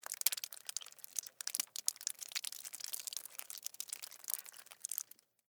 Horror, Blood, Heavy, Drips; Blood and Guts; BlastWave

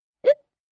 Hiccup Short Hic, Hiccup, 1 child, short; Hiccupping Sound Effects

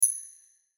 Hit Click High, Hit Click High Reverb; Production Element Imaging Element Accent Transition

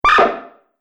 Multimedia Button; Button Sound Effects

